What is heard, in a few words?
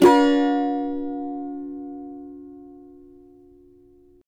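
C minor, Guitars; Spectrasonics; Hans Zimmer Guitars VOL-2; CAVA CHORDS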